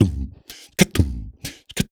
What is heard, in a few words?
Percussion Loops, Brazilian; Houseworx